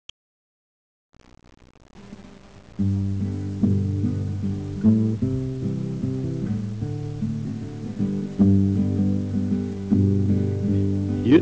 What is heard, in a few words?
VOCAL